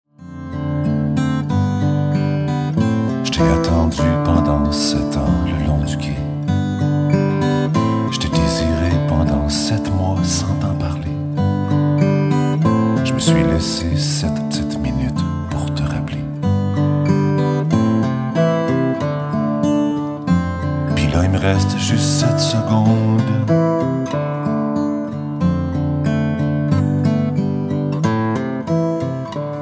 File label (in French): guitar voix